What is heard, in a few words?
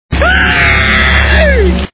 scream; courage